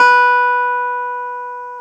KEY X Hohner Clavinet

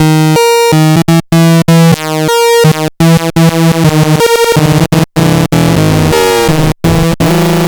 Super Saw Bass; Sonic Foundry (Sony Creative Software); Underground UK House & Electro

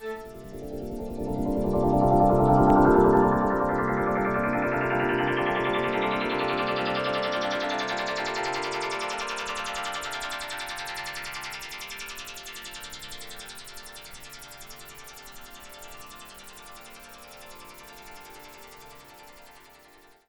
SWELL, AMBIENT PAD

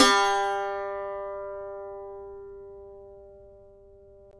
SAZ; Roland - LA Composer vol. 3

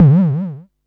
Perc Synth Raw

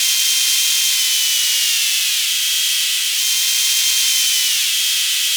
FX, Drum Machines; Fricke Schlagzwerg

